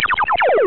MERGING, laser transfer

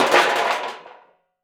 metal can hit, metal bin hit

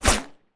smg 9mm shot

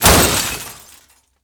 window smash

